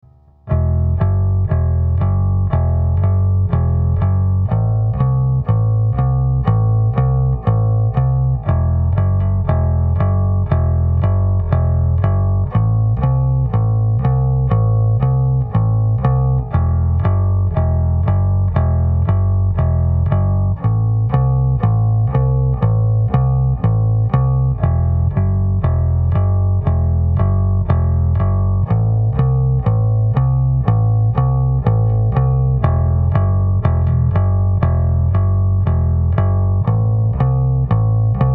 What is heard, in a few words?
creepy bass; Dear Charlotte Creepy Bass